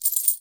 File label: collect Win; jacks or better multiple hand